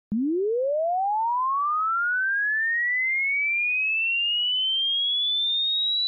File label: chirp; whyyouhearwhatyouhear